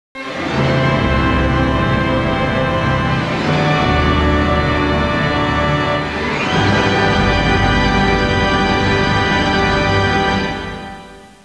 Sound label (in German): musik